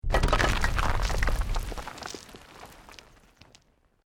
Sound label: ROCK DIRT SLIDE SMALL